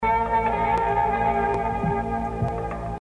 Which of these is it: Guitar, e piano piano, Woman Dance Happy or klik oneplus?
Guitar